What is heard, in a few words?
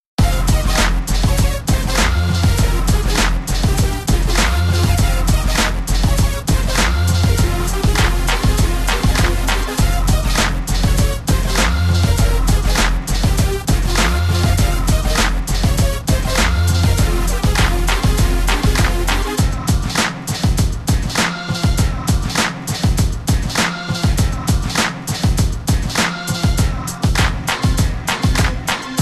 Cool Beat